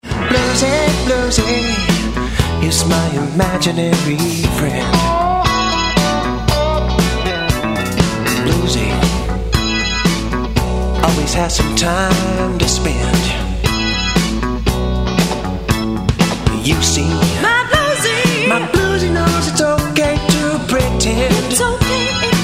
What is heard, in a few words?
Bluesy